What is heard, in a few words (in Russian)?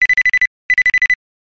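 звук звонка телефона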